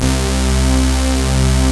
BIG STAGE, synths; Pro-Rec - Synthsonic

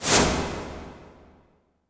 IMP WIPE, Cinematic Impact

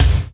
BD SFX, DRUMS; RY30DRUMS; East West; Global Sounds

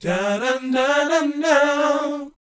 BOYZ, Vocal Planet, R&B & Dance; ILIO

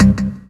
DM ORG1, Dance Mega Synth; Best Service; 90 s Sample CDs

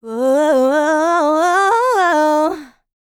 LICKS, Vocal Planet, R&B; ILIO; Dance; LT R+B

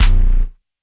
BD, Dance Drums

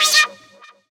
Fx Vox; Equipped Music; Loungin House

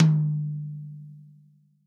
TOM, Real Drum Kit, POP KIT

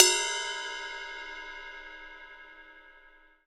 RIDE BEL, drums; Big Fish Audio; Ross Garfield Drums VOL-2; TOPEKA KIT

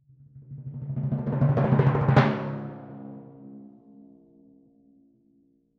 Crescendo-hit D, Timpani; PowerFX; Downtown Orchestra

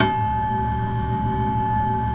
SPACE PI, Pno Hybrid; E-MU Producer Series Vol. 5 – 3-D Audio Collection; 3DSprints; 3DPnoHybrid

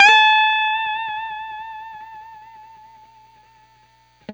BLU BEND UP, Guitar & Bass; 90 s Sample CDs; Quantum Leap - Guitar & Bass

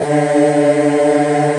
PAD, 140 BPM; Ueberschall; Drum & Bass Flash Trackz

